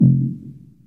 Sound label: Heart Beat